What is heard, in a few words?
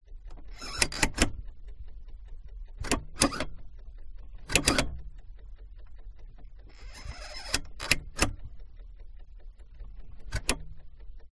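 interior heater switch and clock; detunized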